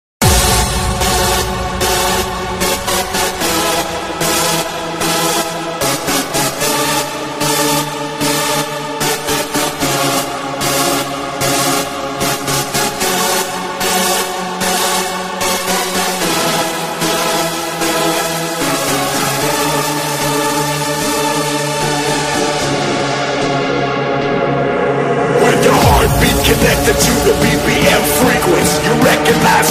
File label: Raver